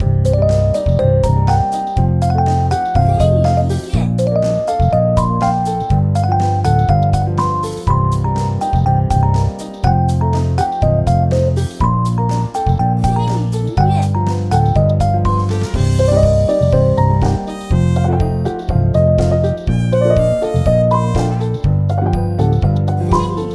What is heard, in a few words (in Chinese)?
背景音乐